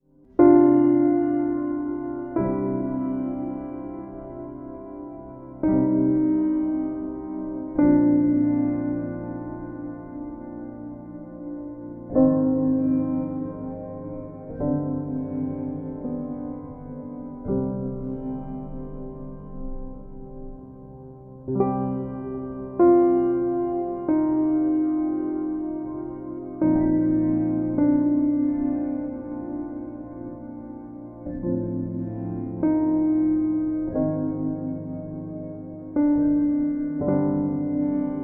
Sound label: piano nostalgia